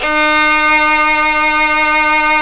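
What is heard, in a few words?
SOLO VIOLA; ILIO; Synclavier Strings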